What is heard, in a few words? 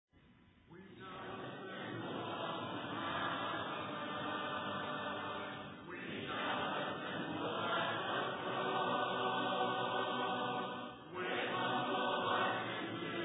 a capella hymn